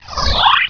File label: camera; Hexen2